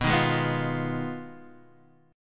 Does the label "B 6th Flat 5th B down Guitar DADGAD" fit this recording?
Yes